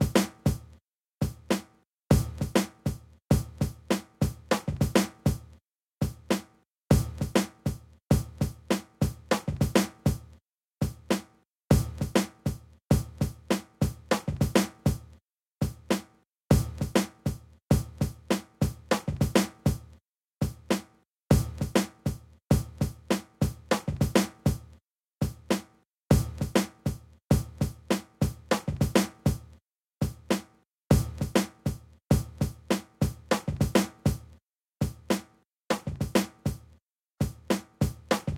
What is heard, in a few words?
Tension Drums Curve; Nova-111; PS Vita